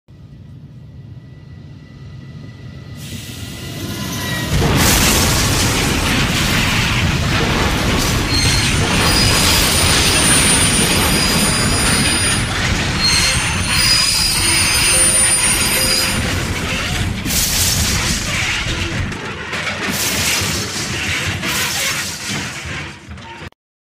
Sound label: Train Crash Sound